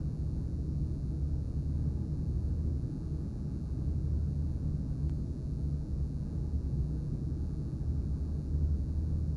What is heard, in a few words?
ambience hallway